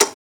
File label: Switch Flip; Ed Edd n Eddy; Adromulus Pack